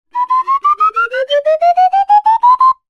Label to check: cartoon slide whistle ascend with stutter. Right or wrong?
Right